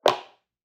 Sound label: footfall heel; soundscrate